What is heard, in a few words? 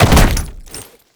Land Heavy Glass; Movement; Warframe; Uriel